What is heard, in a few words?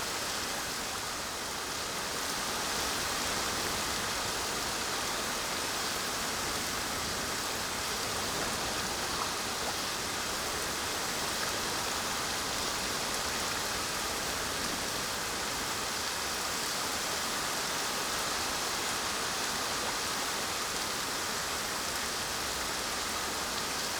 ambience coniferous forest night breeze rainy; Rust